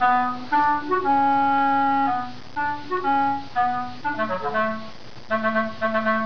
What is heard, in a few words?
clarinet